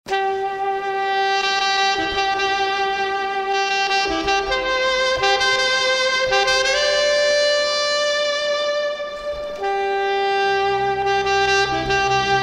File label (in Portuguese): Toque Nupcial